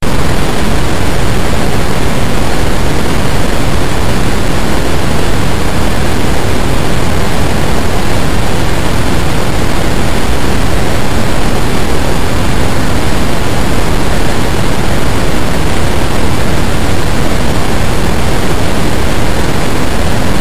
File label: thrust, lander; Lunar Lander